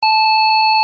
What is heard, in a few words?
Beep; Museum Alarm Options